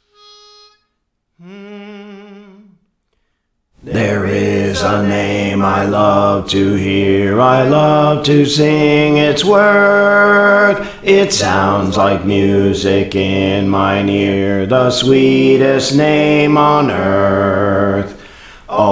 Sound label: Bass, Songs; Praise For The Lord Training